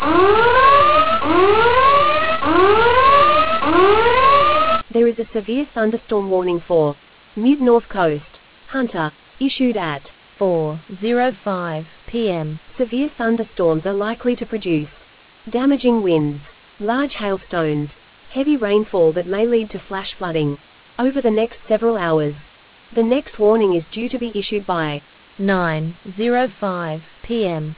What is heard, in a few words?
storm audio